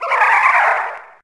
idle, gryphon; Fate The Cursed King; MONSTERS

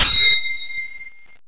counter; Monster girl quest Part1,2,3; Visual Novels

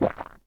ero eat; Monster girl quest Part1,2,3; Visual Novels

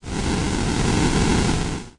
fire; Monster girl quest Part1,2,3; Visual Novels

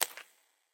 footsteps mudroot; Fate The Cursed King

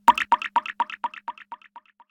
aqua; Monster girl quest Part1,2,3; Visual Novels

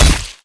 metal flesh; Fate The Traitor Soul

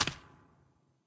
footsteps nest; Fate The Cursed King

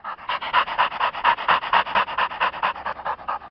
pant, dog; Fate The Cursed King; MONSTERS

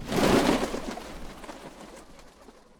bird; Monster girl quest Part1,2,3; Visual Novels

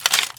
light metal get; Fate The Cursed King; ITEMS

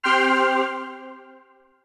magic; Monster girl quest Part1,2,3; Visual Novels

